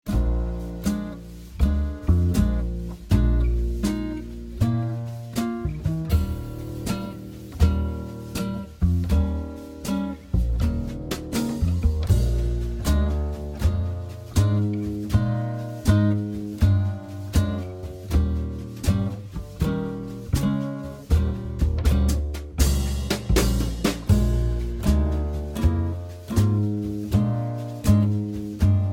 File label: Chords 1D 4D key of Bb